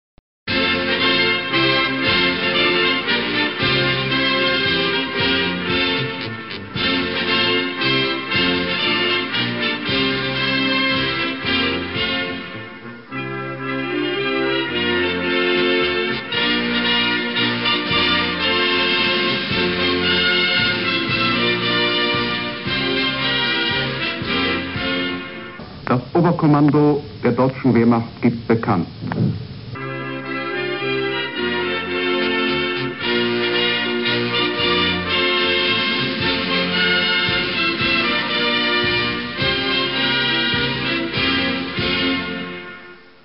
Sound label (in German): polnische hymne; hymnen